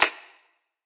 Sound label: click